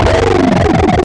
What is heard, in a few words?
hard robot boss; gonner